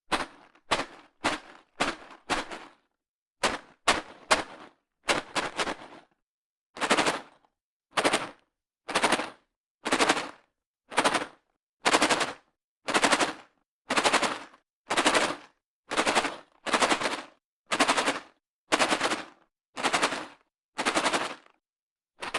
screws box plastic metal shake rattle short medium long; sonniss gdc